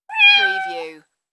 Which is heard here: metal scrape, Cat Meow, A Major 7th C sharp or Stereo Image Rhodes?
Cat Meow